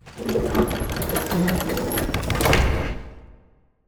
Gate (fortress), completion; 0AD

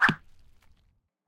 arrow wood, attack impact

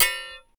shield metal, attack impact

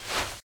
fs sand, singlesteps

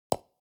construction wood

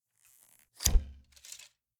bow attack

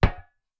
sling metal, attack impact

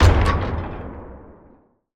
warship attack cannon; ships siege

